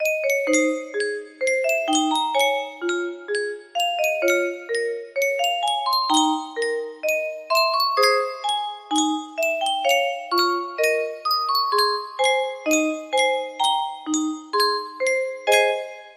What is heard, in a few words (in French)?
yunsheng boite a musique, music box melody